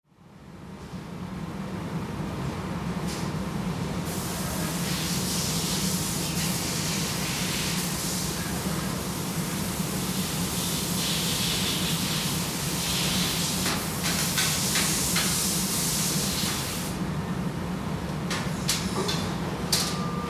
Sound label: Industrial Laundry, factory